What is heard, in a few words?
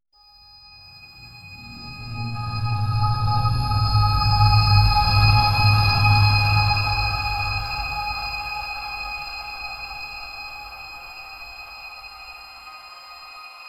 dreamlike nocturnal ambie, mysterious